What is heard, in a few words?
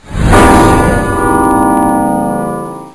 alien bell; quake2; fox